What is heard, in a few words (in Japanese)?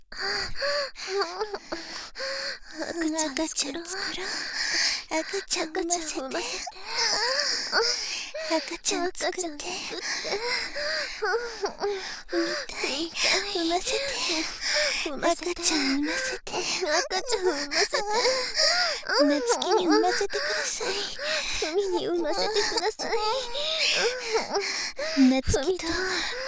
喘ぎ, バイノーラル; 赤ちゃん作ろ♪; ハーレムオナサポ 4人のメイドがご主人様のオナニーをお手伝いするバイノーラル～文、千曜、名月、莉桜～